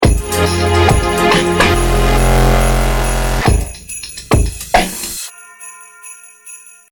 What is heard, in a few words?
Dubstep Xmas Loop